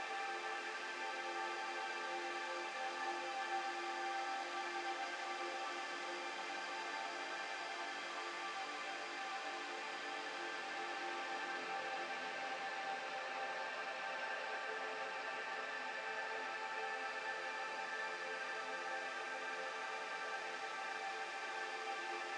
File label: Amb Handyman Musical